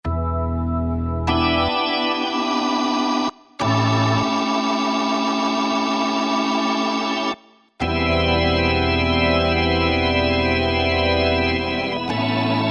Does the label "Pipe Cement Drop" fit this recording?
No